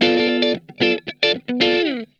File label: STEAMIN, Funk Guitar; Zero G - Funk Guitar